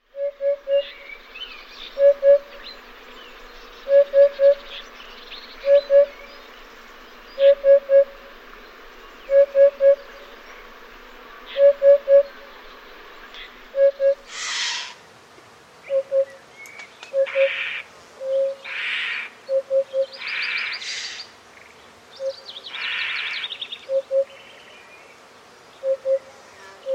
abubilla

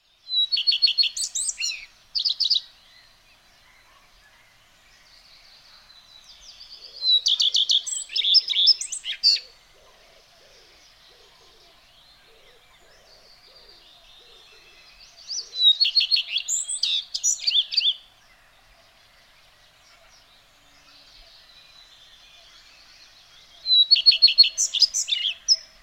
colirrojo real Phoenicurus phoenicurus

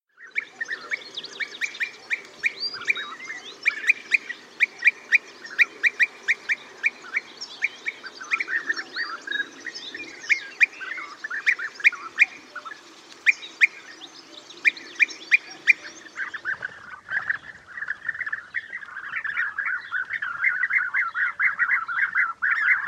abejaruco europeo

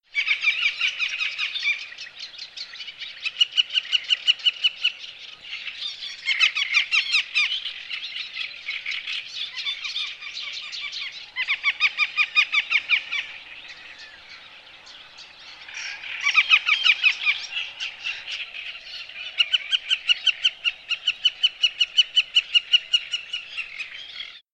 aguilucho lagunero

